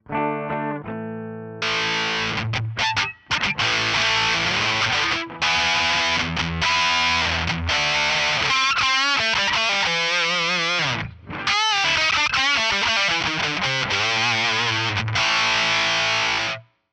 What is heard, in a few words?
Hammer Tight, Distortion Brutal High Gain Distortion; Black Hammer Distortion Brutal High Gain Distortion